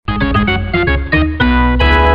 Oppo SMS Tune

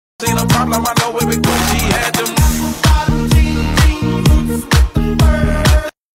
Ibzia Mix Ringtone

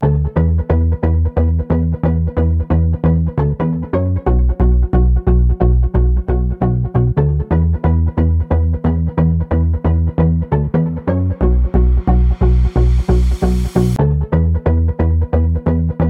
iPhone 12 Pro Max Guitar Ringtone

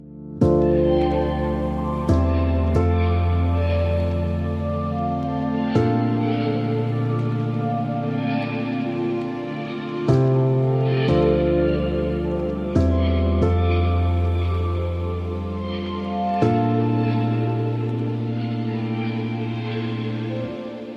Huawei P20 Lite Ringtone

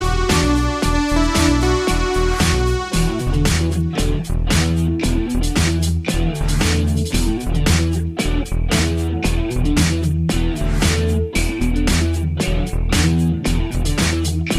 Samsung Festival Ringtone

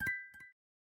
honor 9 lite ringtone

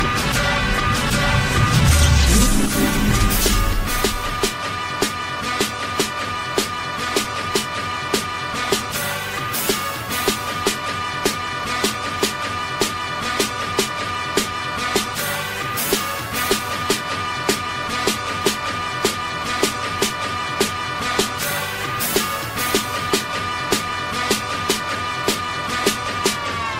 Hollywood Movie Ringtone English

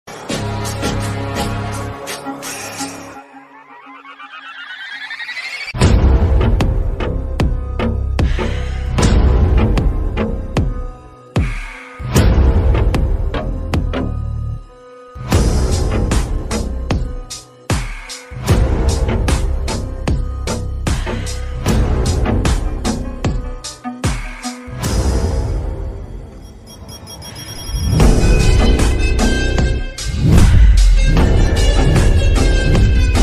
Attitude BGM Ringtone

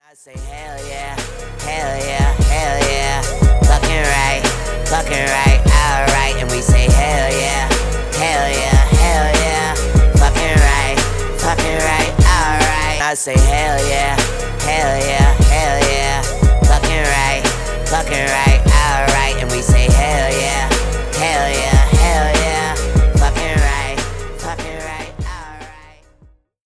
English Ringtone

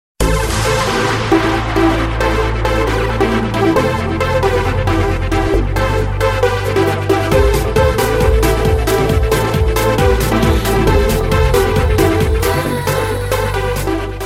Club Mix Ringtone

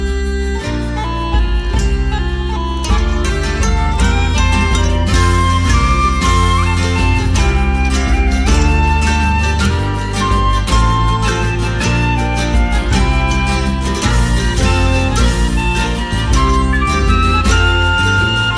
Cartoon Hip Hop Ringtone

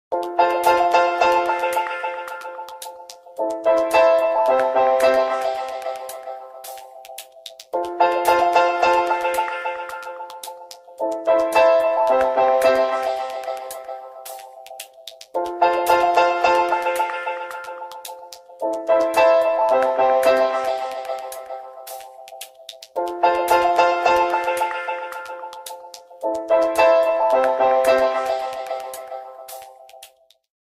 Cool Nokia Ringtone

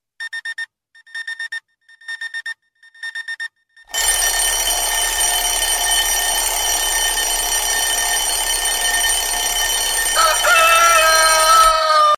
High Loud Alarm Clock Ringtone

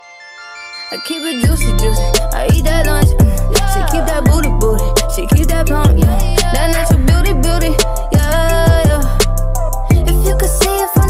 English Ringtone

